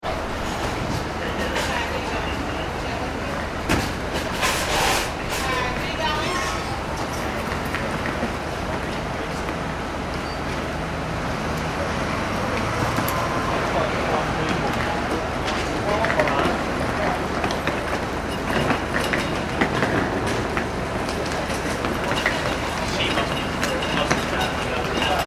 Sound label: ambience airport taxi rank outside terminal; Airport Taxi Rank Ambience - Engine Noise Of Queuing Cabs , People Walk And Talk And Bagga; jfk; Airports; Backgrounds; McKinney Sound